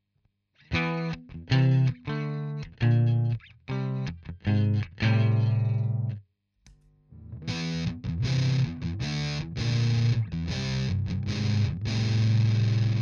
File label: Bridge Sustain